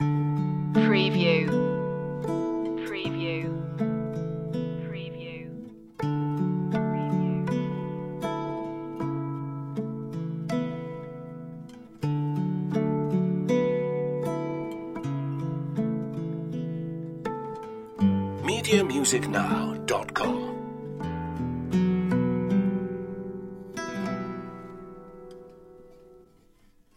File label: a quiet heart loop